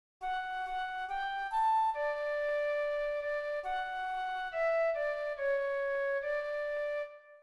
FLUTE; Psaume88

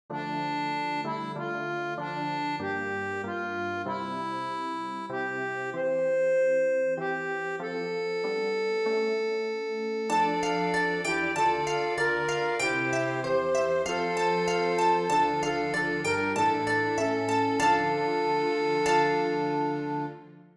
KYRIE ORCHESTRE; St Jean

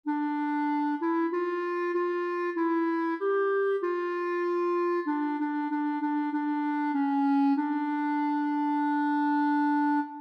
KYRIE CLARINETTE; St Jean

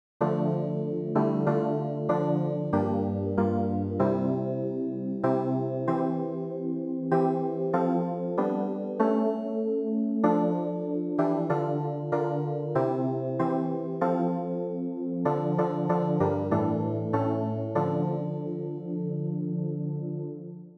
KYRIE CLAVIER; St Jean

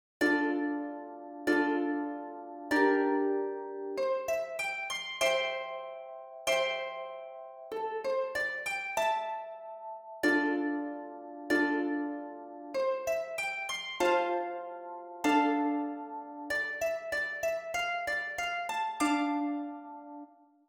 HARPE; ANAMNESE St Jean

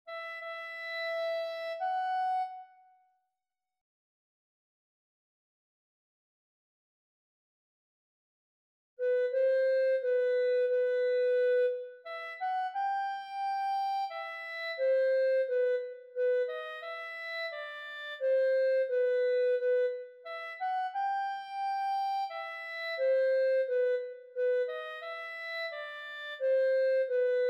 CLARINETTE

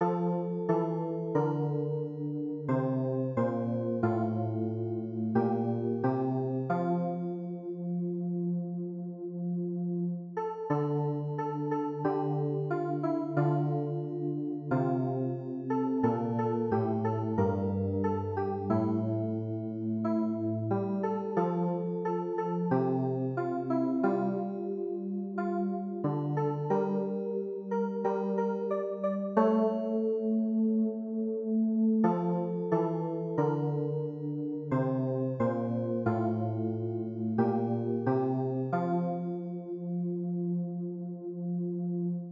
clavier

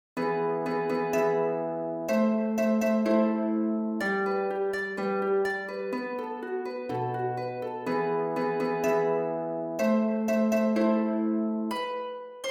harpe

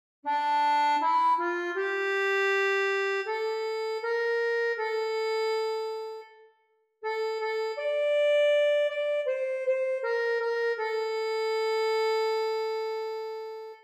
ACCORDEON; Psaume 129

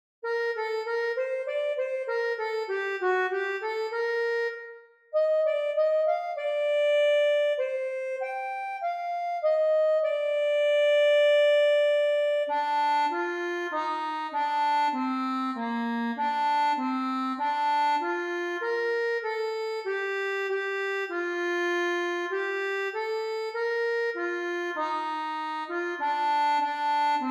accordeon; PriereDeFrancois

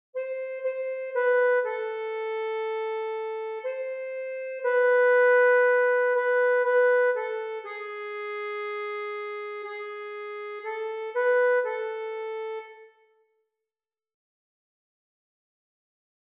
ACCORDEON

